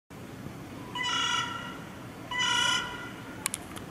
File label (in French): corneille